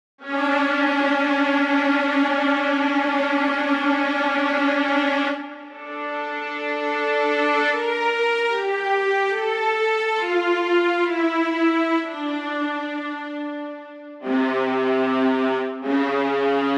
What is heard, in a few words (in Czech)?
VIOLE celek